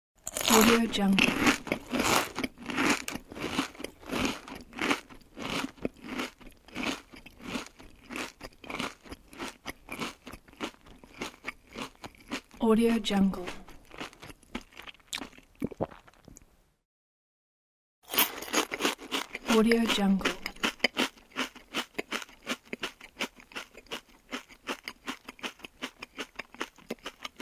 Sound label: chips